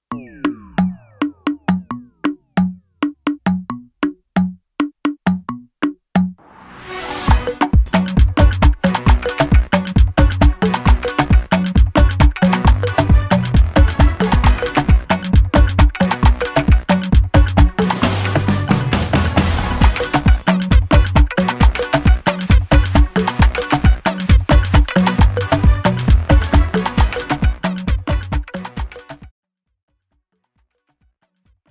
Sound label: INSTRUMENTAL